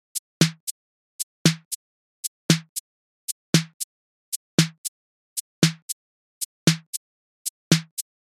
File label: 808 Snare HiHat; TAL